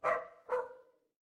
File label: animal dog bark